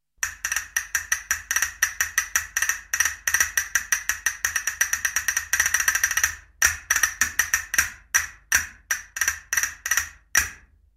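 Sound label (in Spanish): Castañuelas, Percusión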